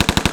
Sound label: Weapon Machine Gun - Fire Loop